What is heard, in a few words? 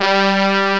brass G2; ClockWerx Demo Music